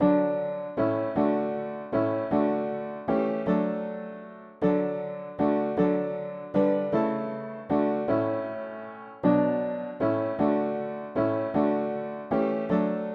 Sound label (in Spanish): mono piano; hymns; Himnario pequeno